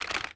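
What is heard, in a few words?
reload movement; Valorant; marshall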